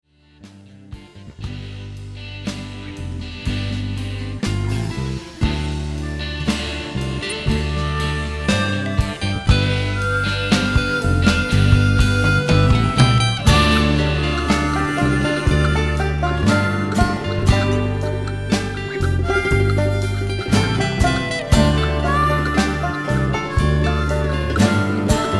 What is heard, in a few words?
instrumental sample